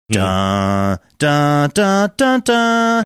singing first few notes

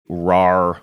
"rawr"

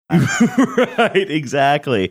laughing saying right exactly

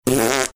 making fart sound

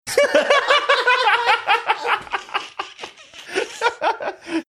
having good laugh

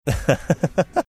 cute laugh

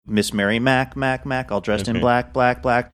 singing all dressed in

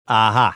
ahha

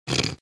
snorting

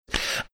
death rattle

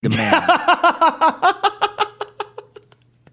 awesome laugh

